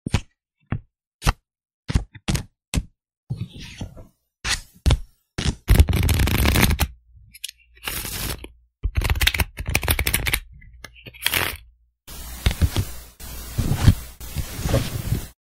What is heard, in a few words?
Casino card; کارت کازینو